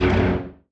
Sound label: distance laser hit; weapons; Stellaris